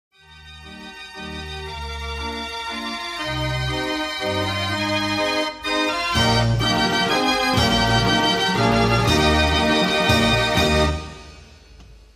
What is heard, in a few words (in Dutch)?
draaiorgel; de freie